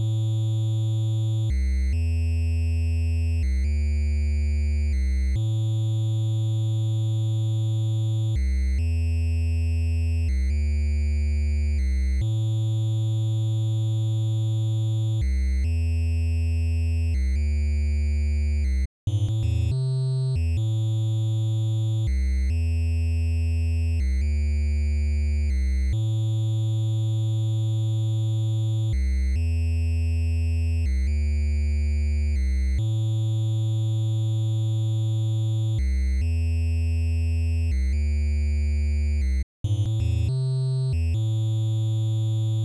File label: bass; anarship space muggler game design